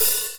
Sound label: hihat; osu